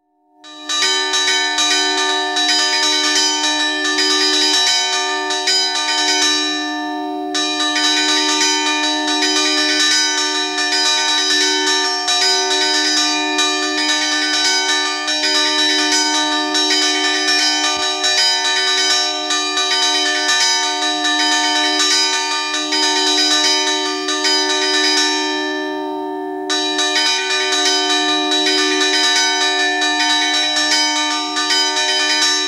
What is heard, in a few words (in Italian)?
campane